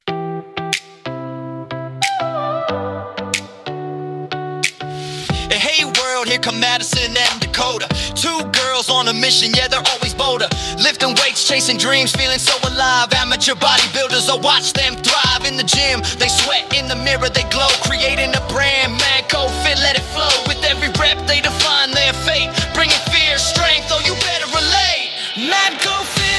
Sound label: rap male; madco fit